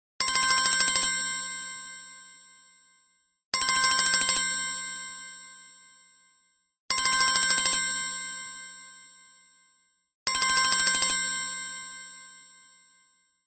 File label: samsung s24 chime